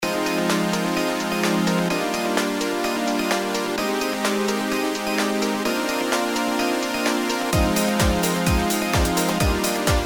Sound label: synth pad